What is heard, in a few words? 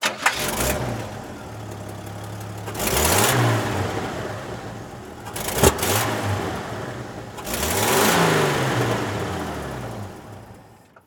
Truck Chevy; Blastwave FX